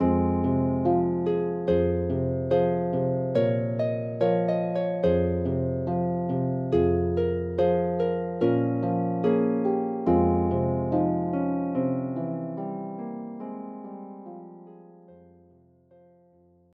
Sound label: Harp; Famous Music for the Harp Vol 6